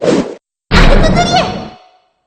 cannon full; celica

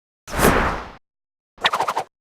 umb close; betty

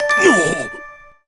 surprise; burley

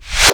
smack reverse

